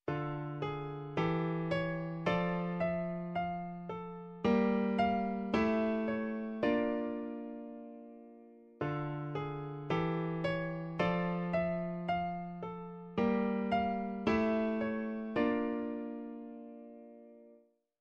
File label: minor interrupted; Grade 8